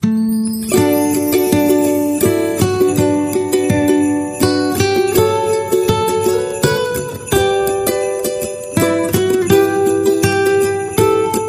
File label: Away In a Manger Ukulele Bells